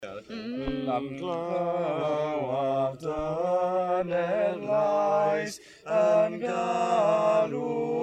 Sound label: Welsh Songs Several White Males 20s 40s; Europe